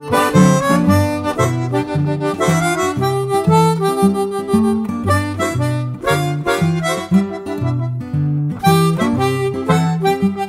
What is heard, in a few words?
Blues